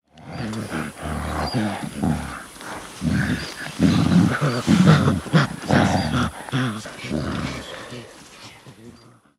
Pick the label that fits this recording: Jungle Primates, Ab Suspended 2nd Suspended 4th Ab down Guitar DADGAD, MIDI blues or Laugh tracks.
Jungle Primates